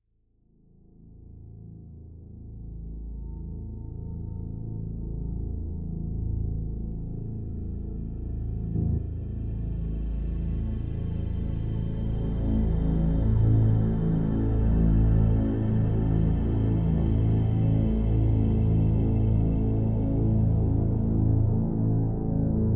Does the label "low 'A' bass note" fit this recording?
Yes